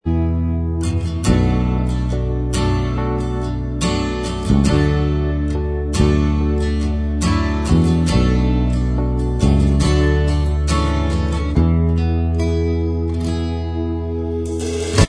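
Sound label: instrumental Track, backing tracks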